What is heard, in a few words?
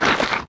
Monster Skeleton type Attack; Inotia 3